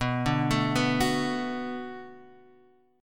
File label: B Minor 7th B Guitar Standard, chord; 7,5,7,4,7,x; arpeggiated; capo=4